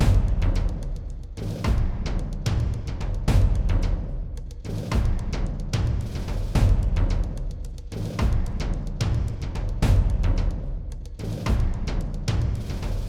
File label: Garden IDLE PERC loop